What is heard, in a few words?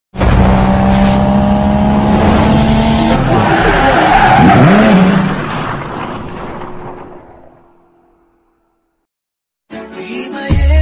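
love background music ringtone; raja rani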